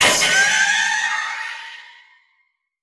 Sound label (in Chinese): 死亡声; 悦灵